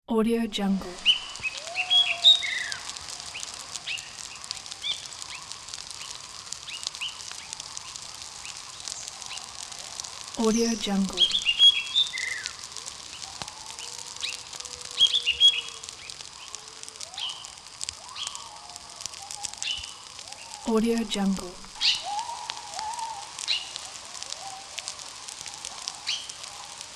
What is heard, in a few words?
forest campfire